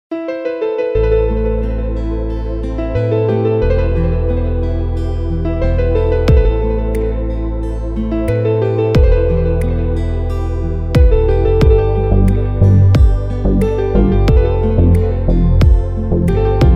arabic remix

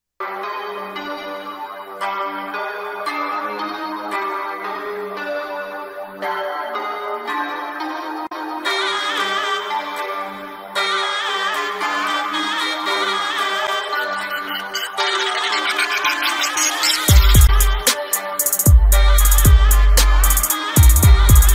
beat

